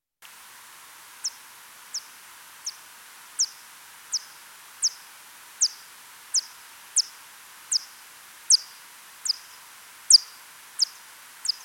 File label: ca myotis